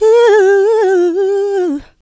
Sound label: Vocals, Adlibs; Houseworx